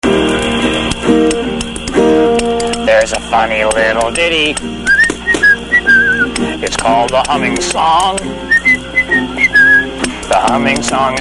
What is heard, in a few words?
Humming Song; RedGreen; CampFireSongs